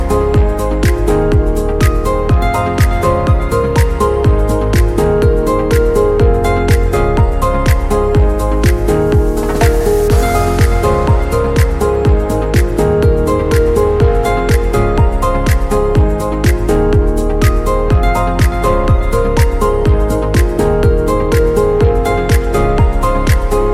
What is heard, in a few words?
Romantic Dynamic Dance Song Summer Party; MIUI12